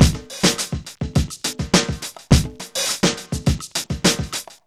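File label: 104 bpm, Funky loops; Zero-G; Funky Elements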